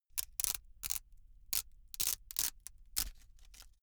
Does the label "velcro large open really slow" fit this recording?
Yes